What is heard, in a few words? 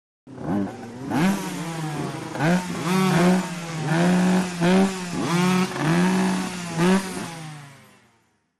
Motorcycle 125 Rev, motocross